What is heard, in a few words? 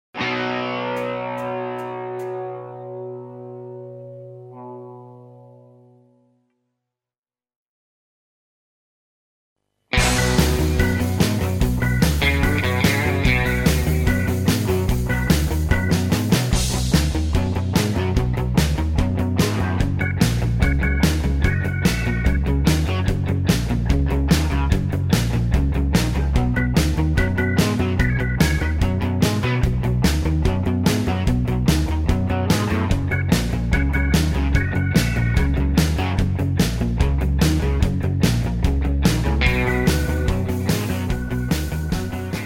B No Backing Vocals, backing tracks